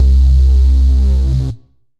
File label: Sub Fuzz, bass; Ableton; Glitch and Wash